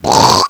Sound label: Explode, Vocals; Bad Vocals